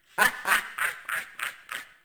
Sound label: Laugh; Anna Ki; Warframe; Caliber Chicks Minigame; Boss